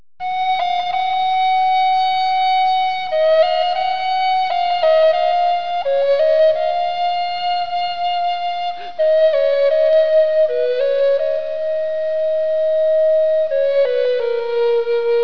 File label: recorder alto